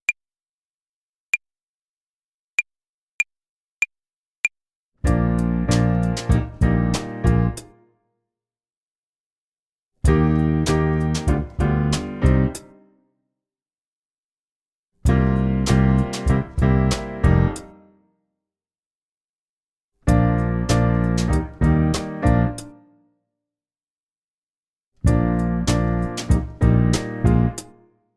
Tempo und Rhythmus Übung 3 und 4 HiHat-, Play-along, Jazz Club Violine

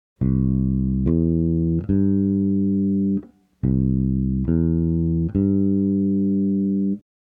Dur-Dreiklang, Bass

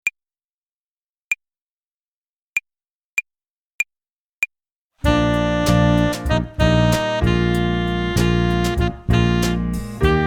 Tempo und Rhythmus Übung, Play-along, Jazz Club Tenorsaxophon

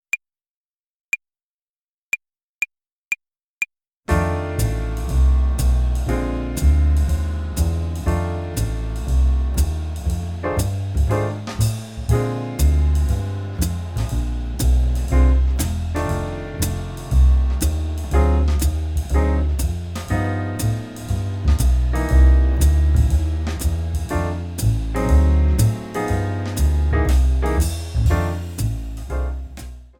Blues-Tonleiter Übung 1 bis 6, Play-along Medium, Jazz Club Violine

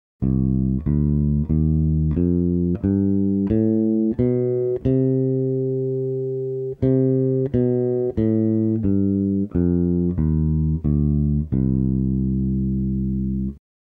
Melodisch Moll, Bass

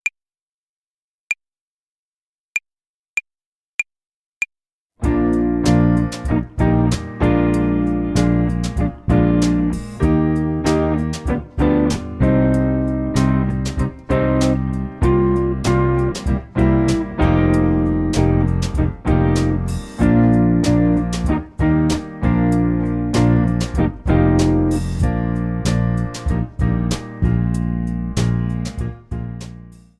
Tempo und Rhythmus Übung 2, Play along, Gitarre; Jazz Club

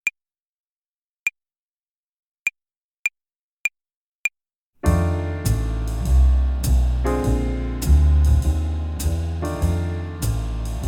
Blues-Tonleiter Übung 2, 4 und 6, Play-along Slow, Jazz Club Trompete

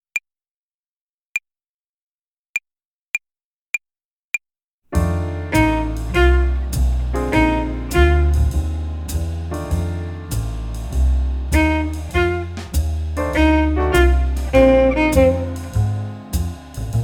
Blues-Tonleiter Übung 5, Play-along Slow, Jazz Club Violine

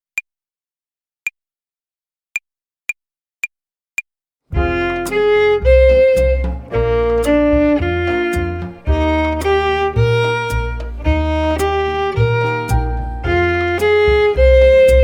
Akkordzerlegungen Übung 1, Play-along Slow, Jazz Club Violine

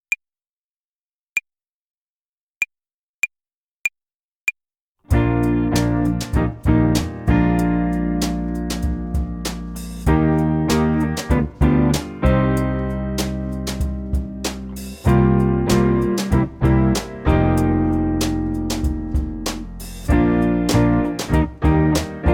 Tempo und Rhythmus Übung 1, Play-along, Gitarre; Jazz Club

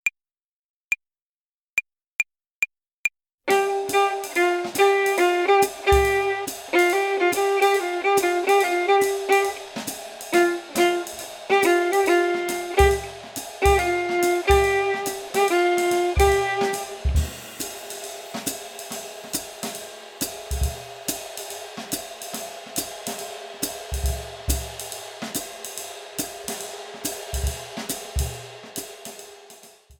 Swing-Phrasierung Übung 5, Play-along, Jazz Club Violine

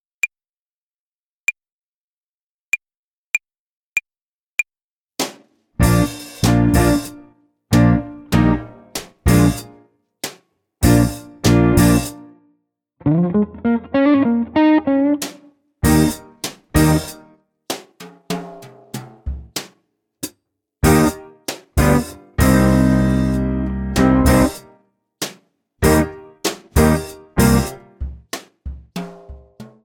Tempo und Rhythmus Übung 7, Play-along, Gitarre; Jazz Club

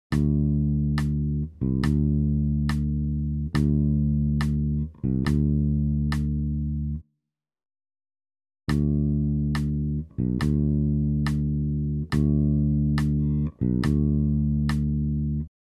Pattern, Bass Unlimited

